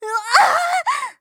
ぐあああああ！, 騎士

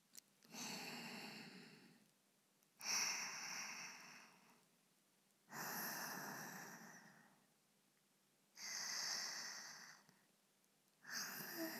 寝息; スキ×キス ご主人様が眠りに落ちるまで耳元で好き好きちゅっちゅしてくれるメイドさん; DL製作班

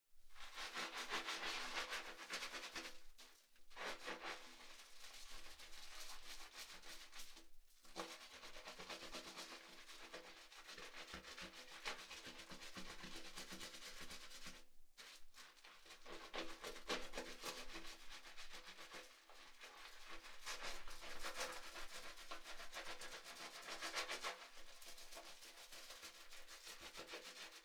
相手 シャンプー を する, お風呂に関する音; 3DIO

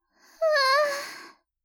"ふわああっ", 天然; ハイグレボイス集

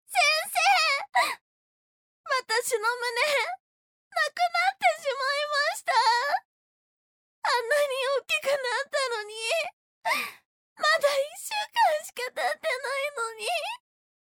絶叫 ボイスのみ; 3章再診その二電撃治療で絶叫から放尿

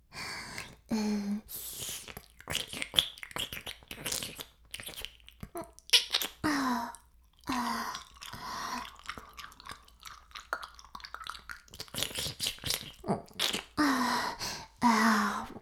耳舐め音声 LR逆, ASMR; 白くて黒い魔性円環

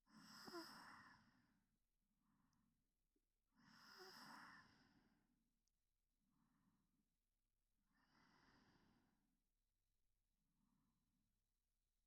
添い寝 右耳ver

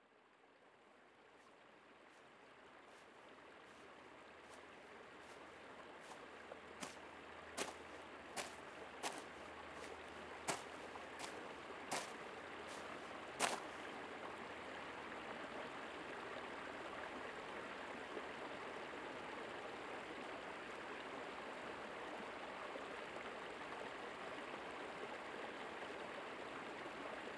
川, 音探し; ラン; 雨水川

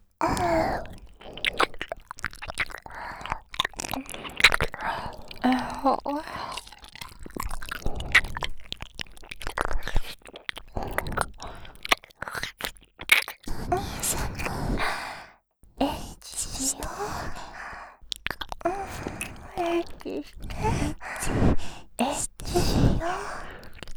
エッチしよ♪ 耳舐め; 静華

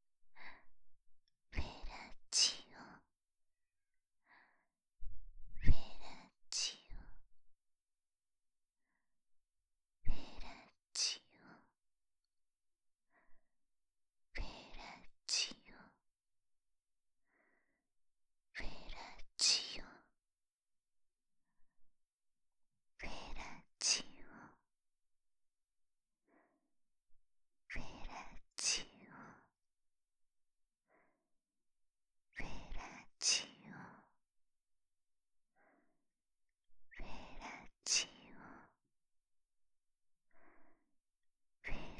フェラチオ, ASMR, 左耳

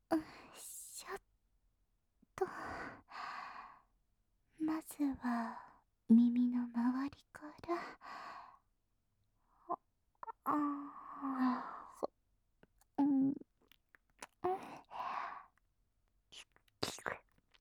耳舐め 音声; 後日、耳舐め音声を収録してみた

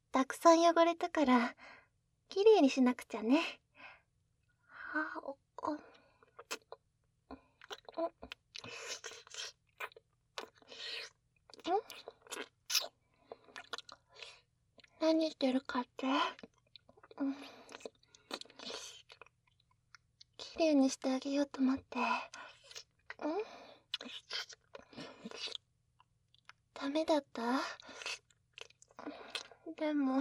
お掃除フェラとぱいずり; えっちなごほうび

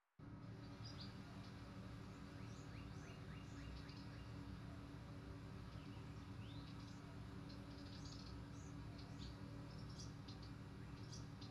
耳かき＆耳つぼ推し（右耳）, ASMR; ありすの耳かき＆耳つぼ推し（右耳）

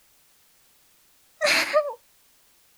「ああん！」

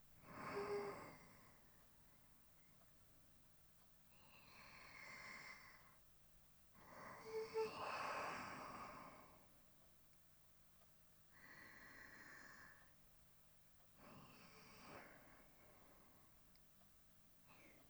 寝息; 寝息好きな人向け; 音量調整

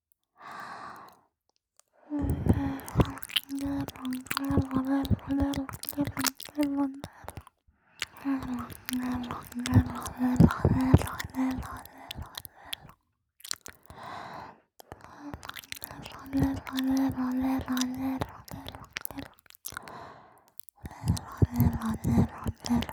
レロレロレロ…, ASMR

